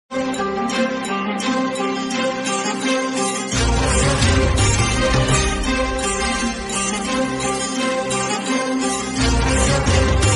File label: bgm attitude theme ringtone dj; vikram x mankatha